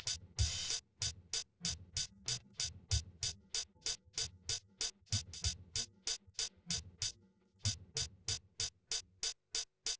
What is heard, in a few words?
wdrums inst src; SiSEC08; SiSEC underdetermined